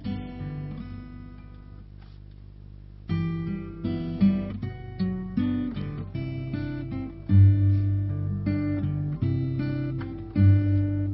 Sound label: Wedding intro